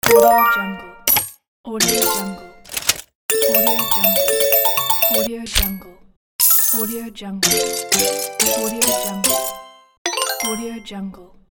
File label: slot machine